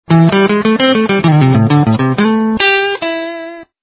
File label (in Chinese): 布鲁斯吉他Solo-短信音